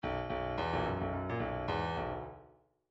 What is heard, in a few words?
midi grooves funk